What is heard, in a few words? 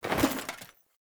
Foley Action Armour Melee Heavy Swipe; Characters; Player; Deora Armour